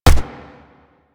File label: Shot Fire; Warframe; Afuris